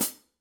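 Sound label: signature precision 14 hi-hat chick, cymbales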